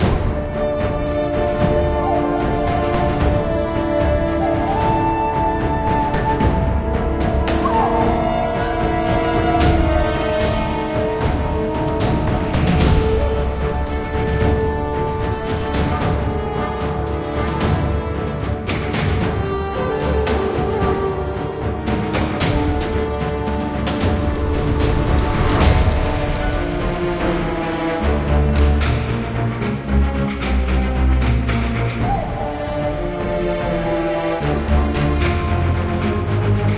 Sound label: music fight sad; Naruto Ultimate Storm; android